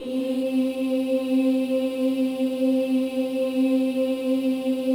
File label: BOYS EES; Symphony of Voices